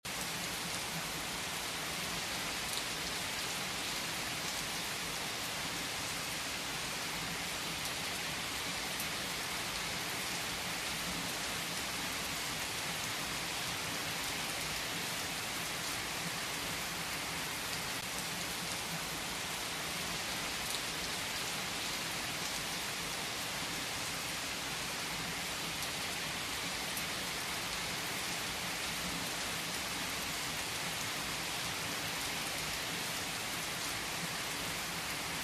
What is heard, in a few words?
heavy raining rain on tree natural clear sound